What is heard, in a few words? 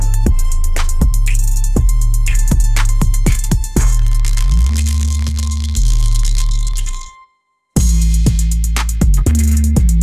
bright lights dark beat; ai